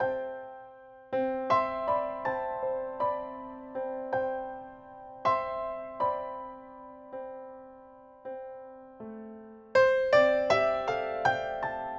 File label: Delicate Piano